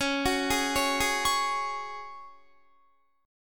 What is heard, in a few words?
Db Suspended 4th Db, Guitar; arpeggiated; 21,21,18,18,20,20